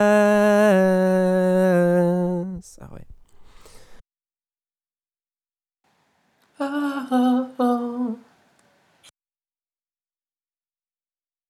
various lalalas; 120BPM; Dry Vocal Stems

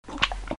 Suck; Lewd SFX

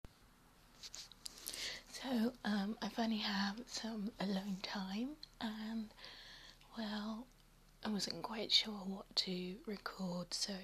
lotsa lady noises

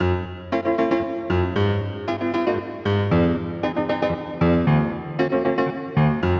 piano; Pony Fan Music Remix Stems

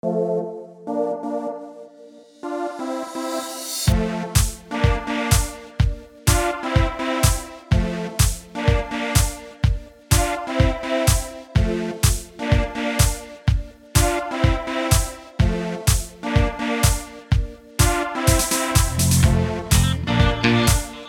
Instrumental Cover; Equestria Girls

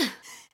Grunt; Lewd SFX

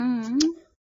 Moan

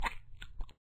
slosh; Lewd SFX

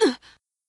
Grunt; Lewd SFX

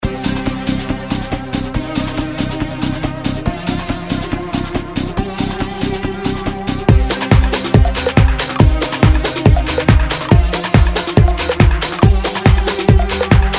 techno night

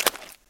Smack; Lewd SFX

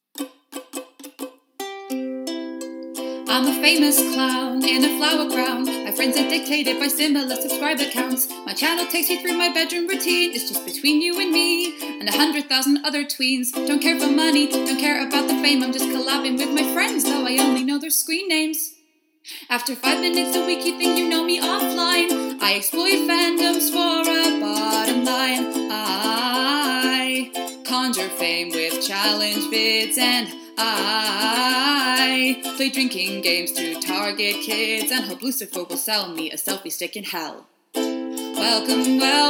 F, Cover, Ukulele; FUNNY SONGS; Gender bending

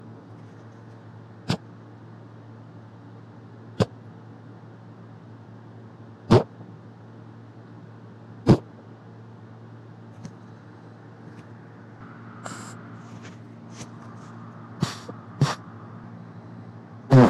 Eggy thick farts

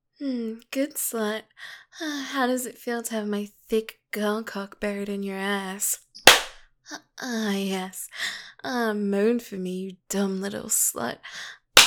Spanking noises; Futa; Girlcock; Domme

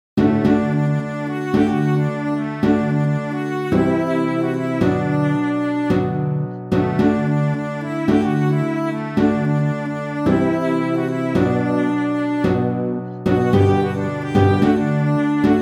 Orchestral Mix

